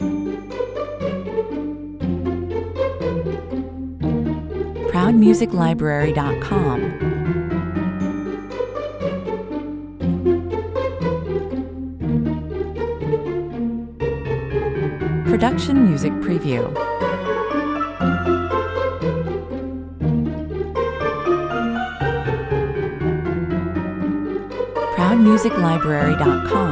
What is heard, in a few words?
no drums perc